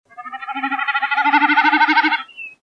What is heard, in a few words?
snipe